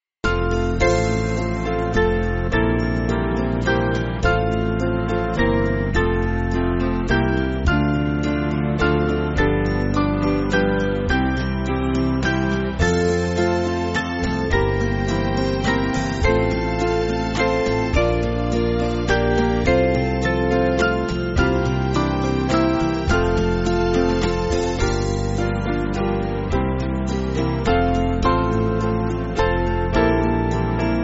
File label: Band, Backing Files for Churches; Thou Who Art Worthy; Godrer Coed